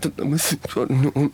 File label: loop